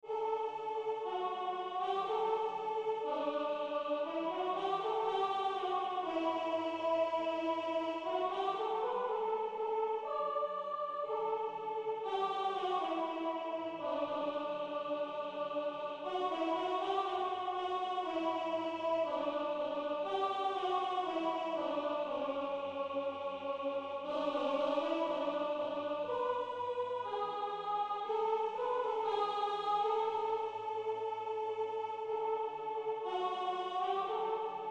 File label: hero sop; See the Conquering Hero Comes; Soprano